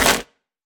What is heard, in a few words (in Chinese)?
红树根破坏声